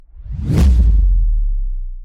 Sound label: whoosh; serkanaa